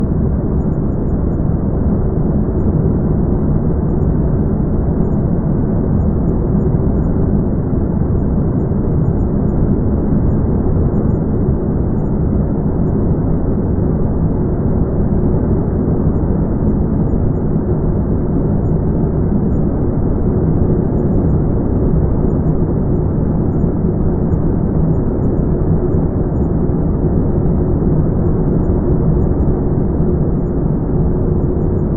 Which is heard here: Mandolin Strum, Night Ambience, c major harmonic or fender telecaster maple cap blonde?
Night Ambience